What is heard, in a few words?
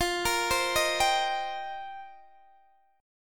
F 7th Suspended 2nd Suspended 4th, Guitar DADGAD; F7sus2sus4; arpeggiated